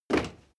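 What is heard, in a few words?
step crowd march loud single left, footsteps; menschen; schritte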